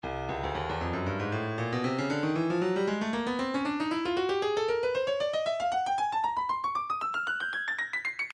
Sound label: breakbeat midi 116 bpm; Icee B; Stylus Breakbeat Midi Files